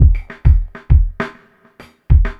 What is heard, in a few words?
drloop, drumloops, Vinyl Attack; Masterbits